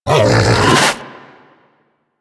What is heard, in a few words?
Snow Critter Vocal Alerted; Warframe; Hunting Critters